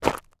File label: footsteps shoe dirt scuff